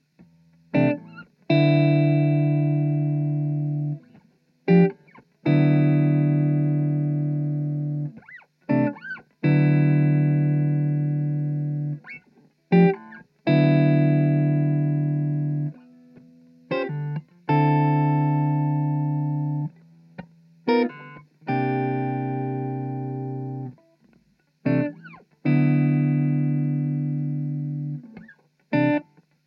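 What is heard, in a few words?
Em 120 guitar jazz chords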